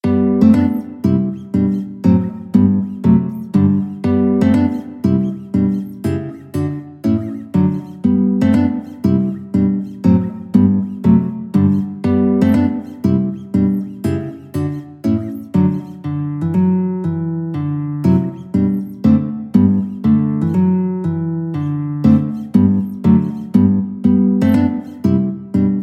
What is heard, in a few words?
march, guitar; soldiers